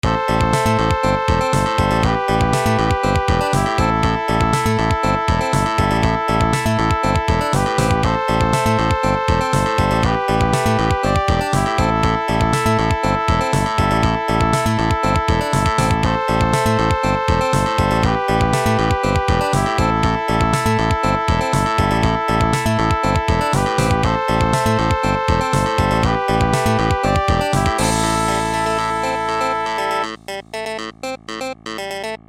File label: PASSED; EDGE RALLY